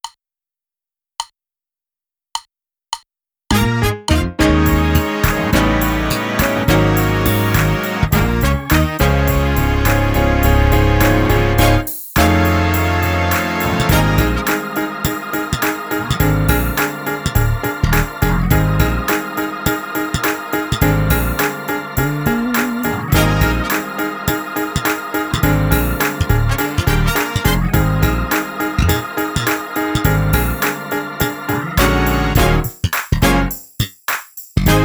90s Choir Jam drumless

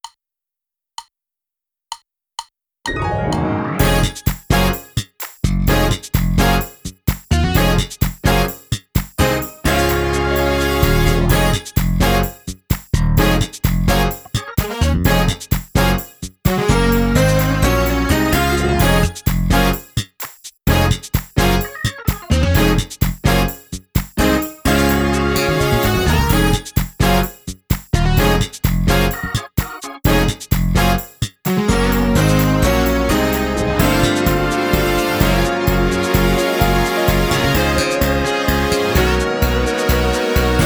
Soul drumless

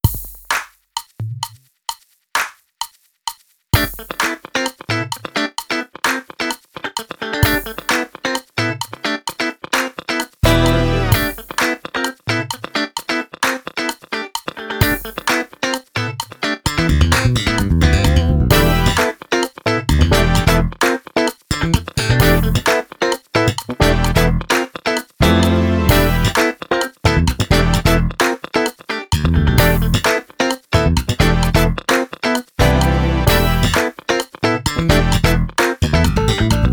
Funkin Fusion drumless

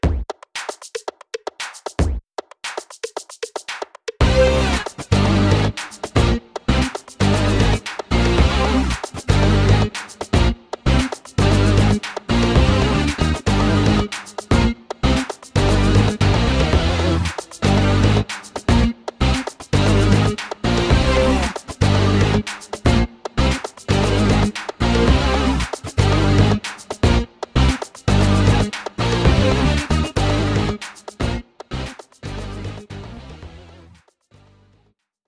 Rock No Drums 115bpm

